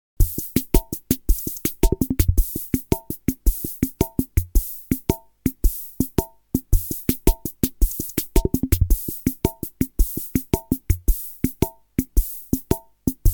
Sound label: rhythm80 var western